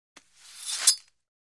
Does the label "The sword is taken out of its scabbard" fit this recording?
Yes